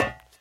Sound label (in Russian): priglushennij legkij metallicheskij stuk